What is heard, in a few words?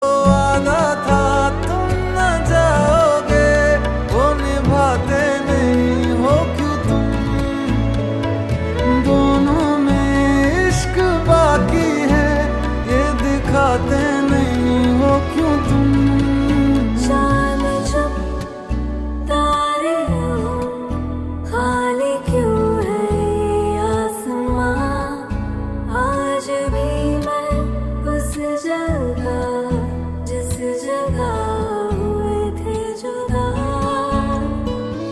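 hindi song ringtone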